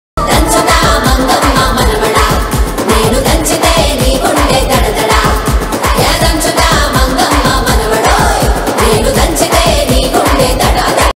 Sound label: telugu ringtone